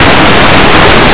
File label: box; Blue Heat